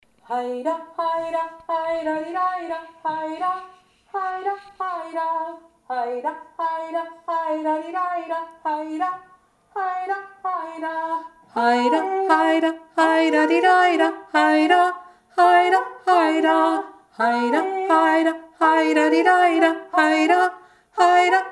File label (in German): haida kanon